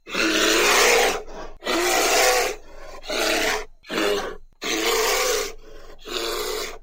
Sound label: bear roar